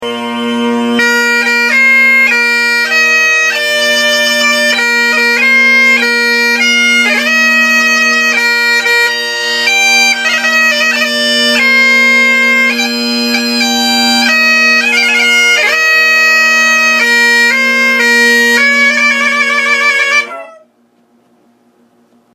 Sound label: PIPES